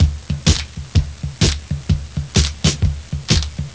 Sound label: loop rock roll